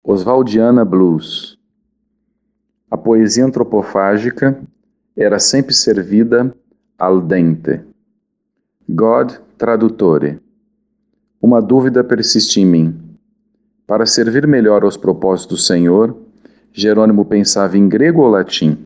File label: blues